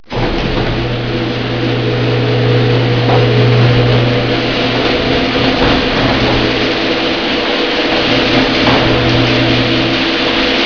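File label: garage door; 8bit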